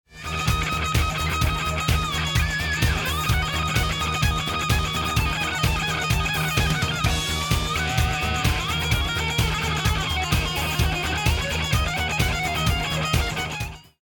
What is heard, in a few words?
won round; music kits custom